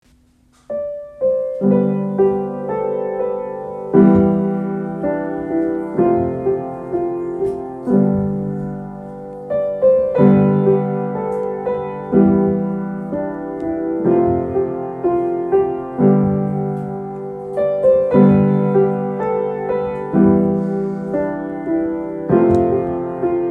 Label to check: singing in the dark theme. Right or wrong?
Wrong